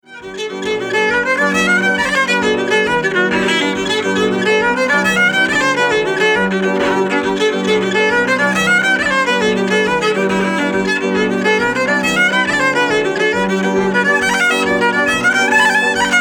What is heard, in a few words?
Trad Jig Key G